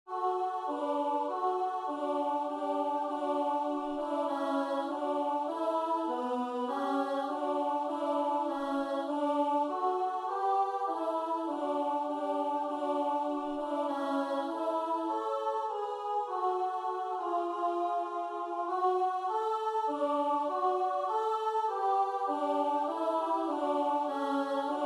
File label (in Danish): lyd Alt SAB; Barn Jesus i en krybbe lå